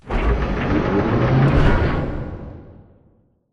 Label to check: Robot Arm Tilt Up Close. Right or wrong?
Right